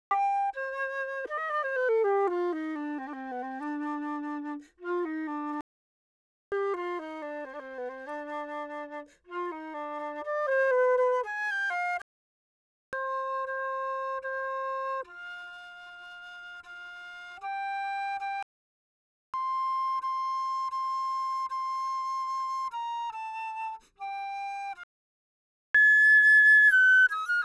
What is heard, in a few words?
Six Flute Melodies